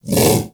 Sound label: Vocal Bark; Warframe; Predasite; Hunting Critters; Characters